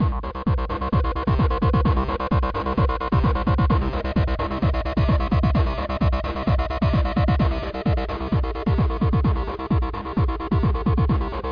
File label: Theme; Ultra Vixen 2